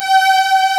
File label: STRG C5; East West; Global Sounds